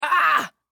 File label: Human Female Strain Grunt; Grunts; Voice